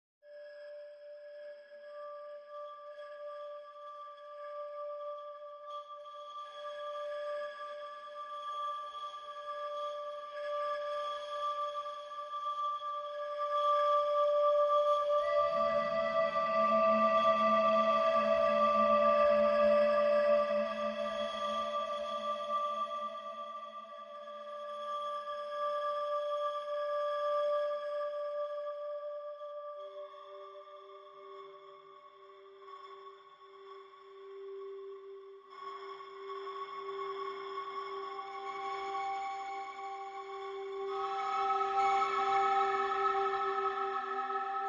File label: Woodwind Only